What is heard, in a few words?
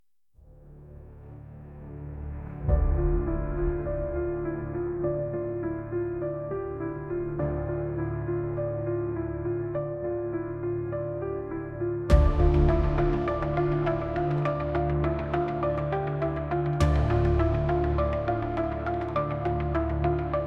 Dark Sonata Instrumental